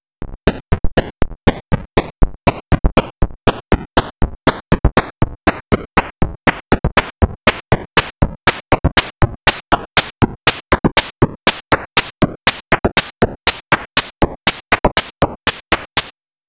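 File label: tuned percussion; colorful noise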